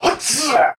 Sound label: Attack Long